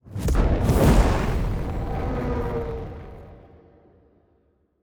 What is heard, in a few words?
ship combustion move; ships; pre-FTL; Stellaris; first contact